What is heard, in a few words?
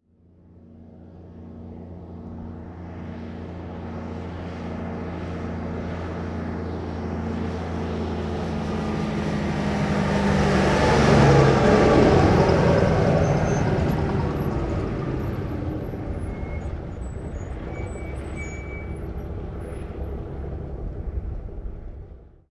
1954 Big Rig Ext Medium In With Brake Stop; Period Vehicles